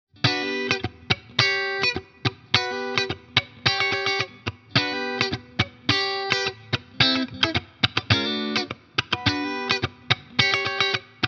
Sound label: Honey Comp funky Comp max. Dry min.; Carl Martin